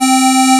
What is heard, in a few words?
Aud Synth C4; Digital Sound Factory Xtreme Lead